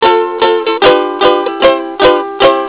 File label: ukulele